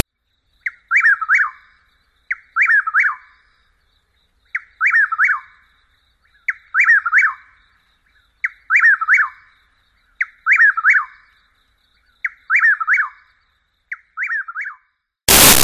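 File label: song, Chuck-will's-widow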